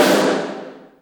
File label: Snares, Real